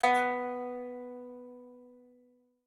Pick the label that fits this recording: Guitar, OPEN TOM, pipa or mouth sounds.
pipa